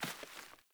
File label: Sandals Walk Vegetation, Footsteps; Characters; Player